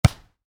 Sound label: Volleyball hit hard, Volleyball (Exterior): Hit Hard; Sports; Miscellaneous Sports